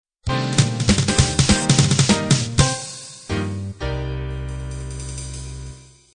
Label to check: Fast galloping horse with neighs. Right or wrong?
Wrong